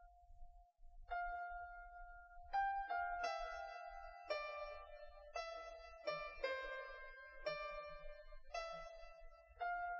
nodrums liverec 250ms 5cm sim; SiSEC08; SiSEC underdetermined

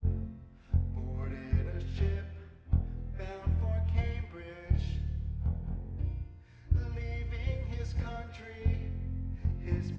nodrums inst sim

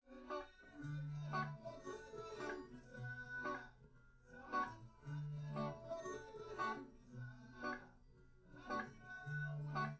nodrums

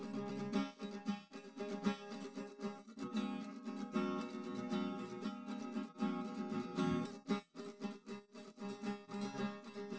nodrums

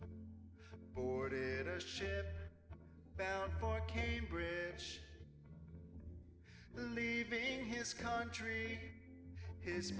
nodrums inst sim; SiSEC08; SiSEC underdetermined

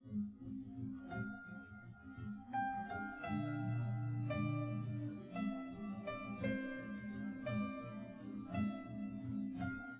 no drums live rec 5cm sim; SASSEC07

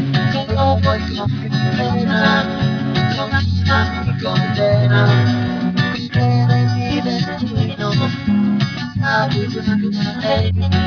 music

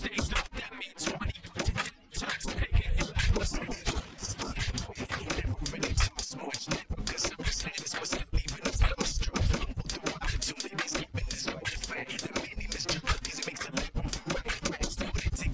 drums; SiSEC11

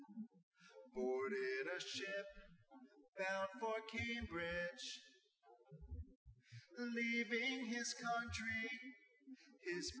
nodrums inst sim

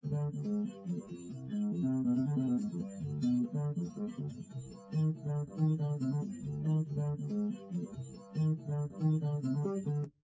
drums; SiSEC11; underdetermined

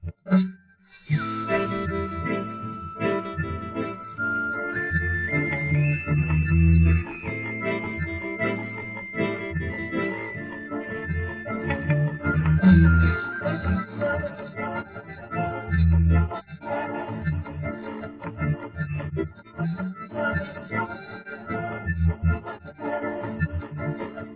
synthe

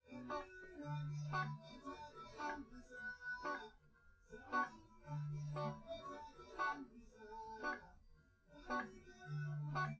no drums live rec; SiSEC10; underdetermined

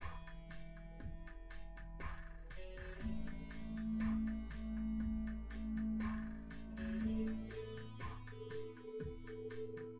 drums synth conv 1m sim; SASSEC07

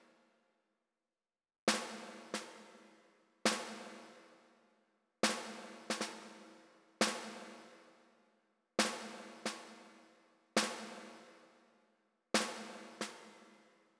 snare; SiSEC11

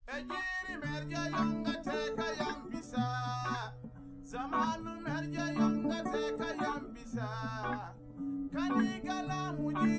test nodrums liverec 250ms 5cm src; SiSEC08; SiSEC underdetermined

